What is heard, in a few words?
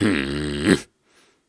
Snarls and Hums, Viking Vocal